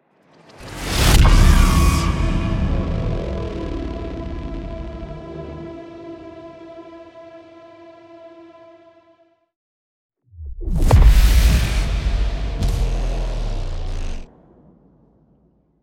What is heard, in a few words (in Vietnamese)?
Am thanh mo dau video hap dan